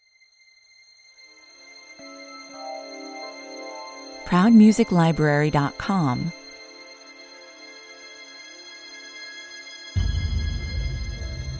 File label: An eerie and disturbing, surreal soundscape that transitions from a chaotic, distressing sonic, 120 bpm